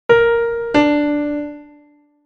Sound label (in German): Intervalle sukzessiv